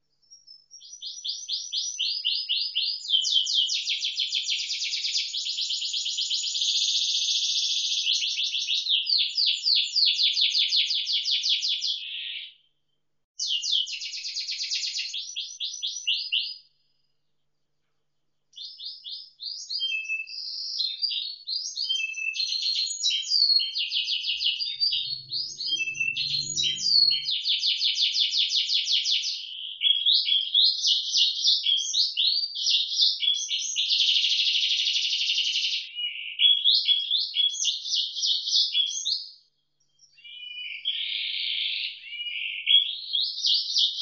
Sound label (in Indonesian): suara kenari isian moza